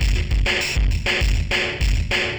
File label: ind dist